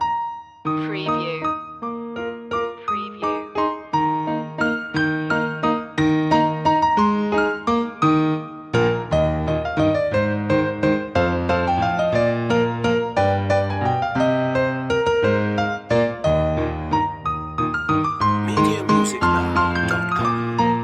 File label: we wish you a merry christmas piano loop; media music now